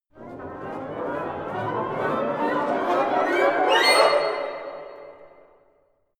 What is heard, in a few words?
orchestra chaos